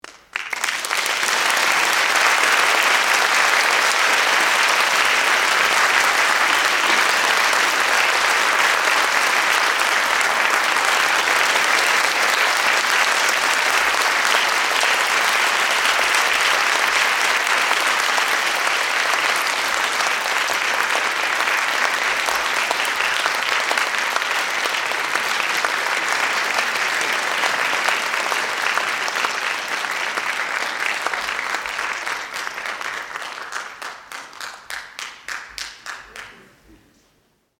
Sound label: long clap